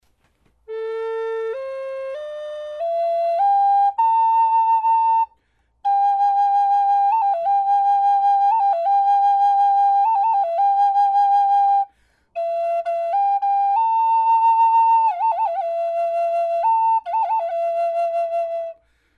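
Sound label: natural, wood flute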